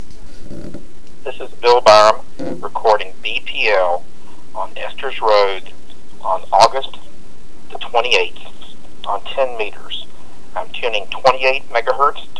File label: BPL 28 - 29.7 MHz; Irving TX